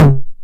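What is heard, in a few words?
909 high tom tape saturation; Toms; Goldbaby Tape808 & Tape909; The Tape 909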